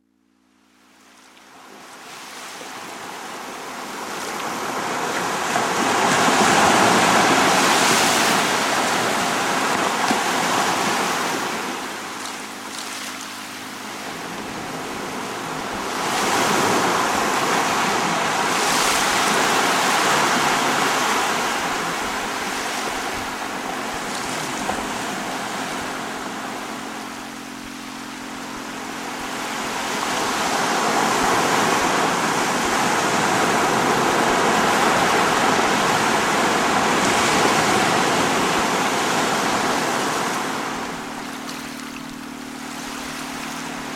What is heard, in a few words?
BRAINWAVE Ocean